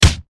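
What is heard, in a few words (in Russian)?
резкая отрезвляющая пощечина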